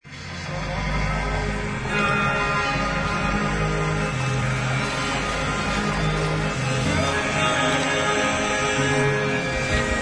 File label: AMBIENT EXPERIMENTAL